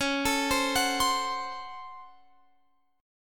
C sharp Major 7th Suspended 4th Sharp 5th C sharp Guitar Open F; C#M7sus4#5; arpeggiated